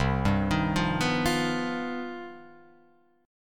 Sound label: C Minor Major 11th, Guitar, Open F; CmM11 arpeggiated; JGuitar